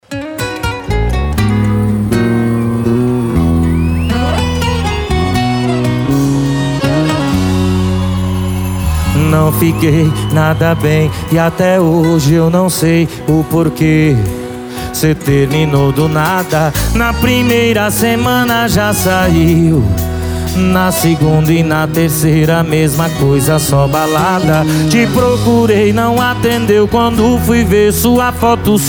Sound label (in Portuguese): sertanejo